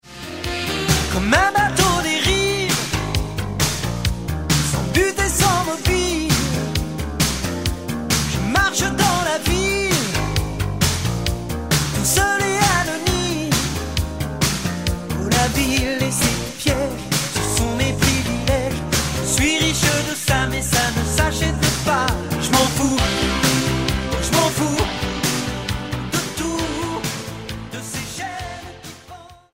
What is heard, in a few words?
yamaha dx7